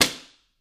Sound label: Book Slam Slap Hard